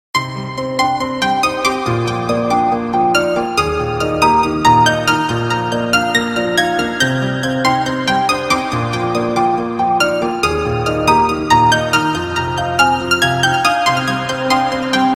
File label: IPHONE 5 RINGTONE